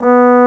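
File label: bass trombone; B2; Multisamples; Digital Sound Factory Virtuoso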